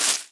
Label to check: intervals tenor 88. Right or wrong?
Wrong